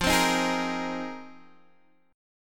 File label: F Minor 11th, Guitar Open G